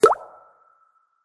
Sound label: lock ringtone, iphone sms ringtones